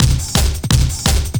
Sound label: LOOP, Eurotech; Sounds Good